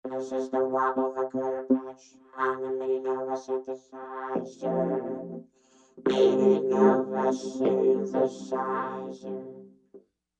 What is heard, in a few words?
Wobble Vocoder, synth